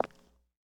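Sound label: Foot Concrete Shoes Walk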